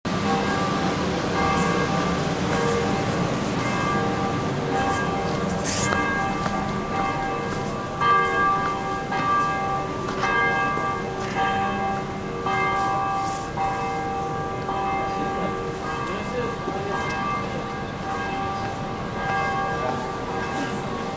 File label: galatasaray church bells